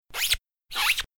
kyukyu

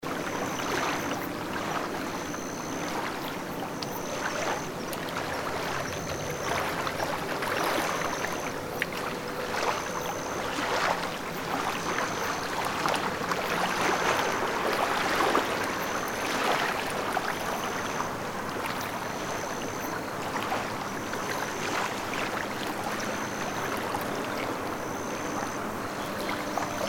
harbor

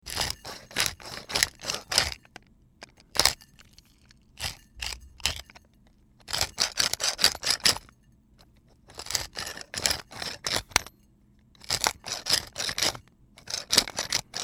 pepper mill

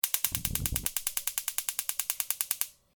gas stove

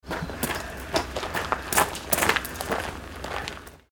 footstep gravel

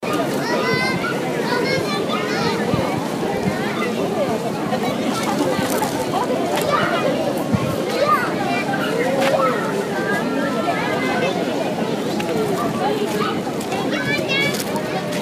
park family kids

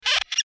comical cute

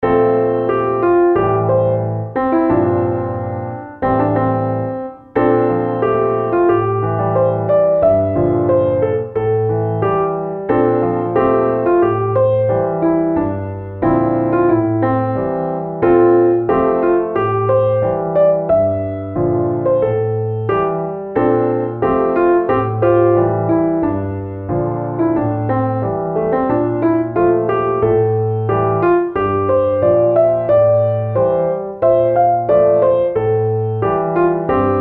piano simple

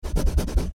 scrub

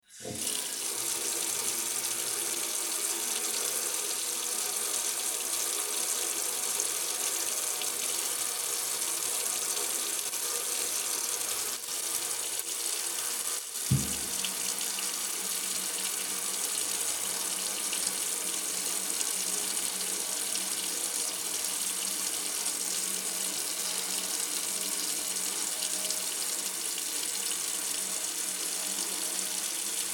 waterway

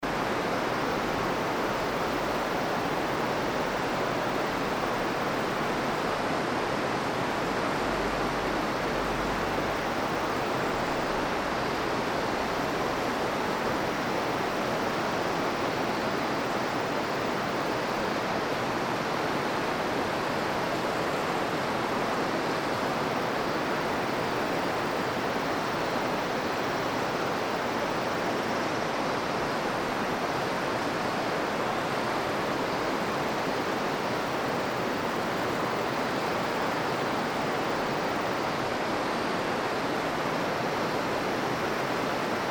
stepped rice paddy

